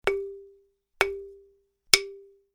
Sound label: Balafon 3x; Acoustique